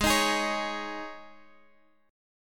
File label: Ab Suspended 4th chord, Guitar, Open F tuning; Absus4; strummed; chordshape; 20,22,20,20,x,x